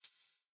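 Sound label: rabbit footstep; smite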